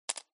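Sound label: checker middle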